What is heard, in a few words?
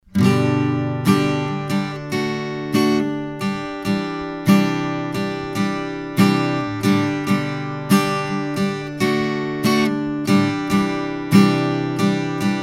Acoustic Guitar D4; mic shootout